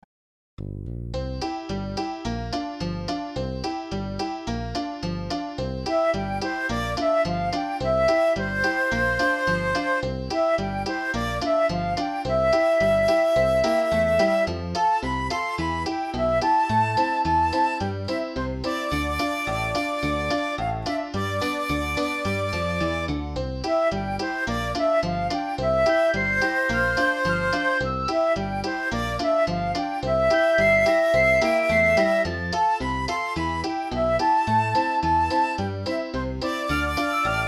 Childrens music

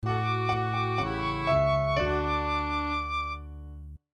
Childrens music end

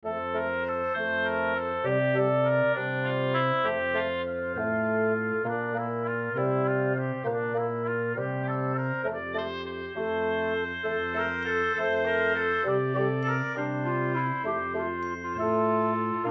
Childrens music

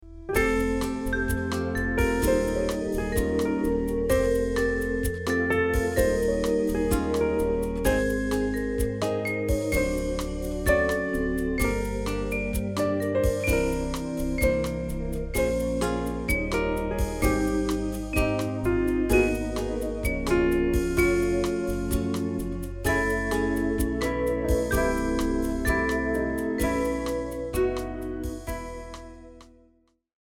Walking Bossa; soft and relaxed

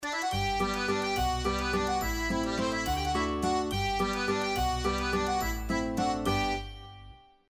Going To France, world music

